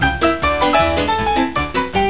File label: piano